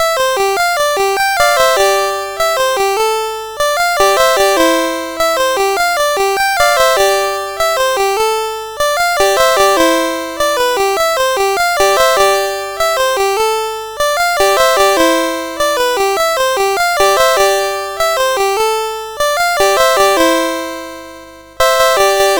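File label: Guitar, MOS CSG8580R5; 3 Short Pieces for Guitar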